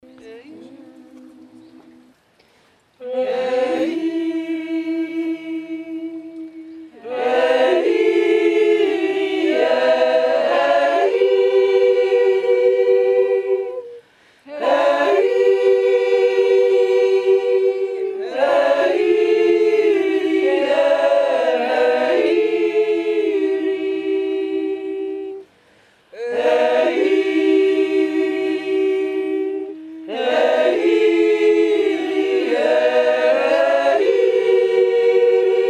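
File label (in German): der kärntnerjodler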